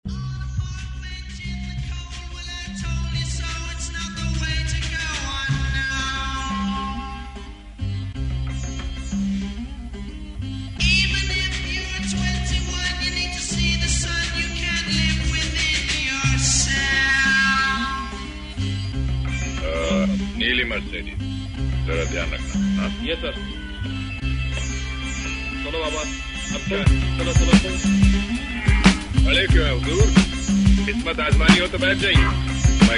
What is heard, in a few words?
sitar, Beat